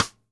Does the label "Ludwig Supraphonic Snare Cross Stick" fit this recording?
Yes